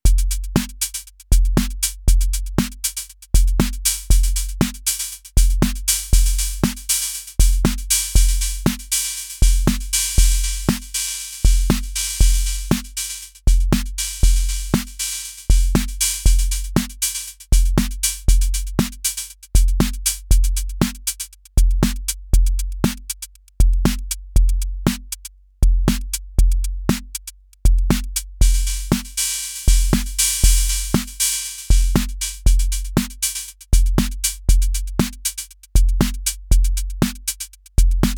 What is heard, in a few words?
Akai Rhythm Wolf Hihat